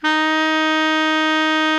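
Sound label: baritone mezzo forte D#3, Sax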